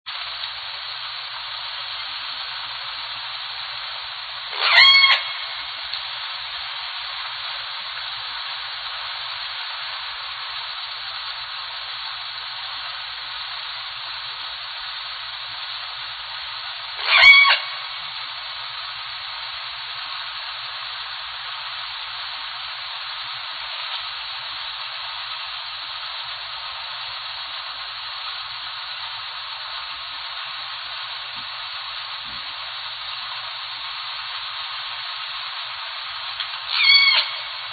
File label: calls